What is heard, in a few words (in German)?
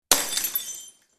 glas faellt und bricht